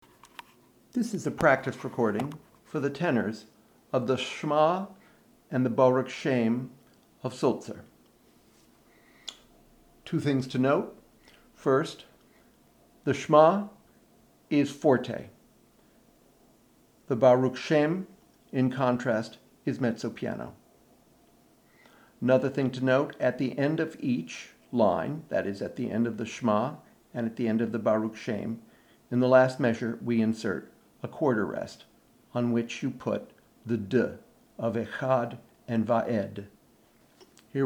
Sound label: Shma and Baruch Shem, tenor, choir; Sulzer